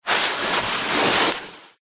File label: human body clothed move shuffle carpet